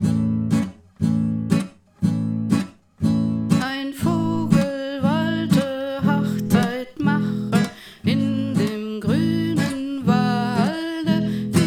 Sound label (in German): Wrumm Tschak Anschlag; Die Vogelhochzeit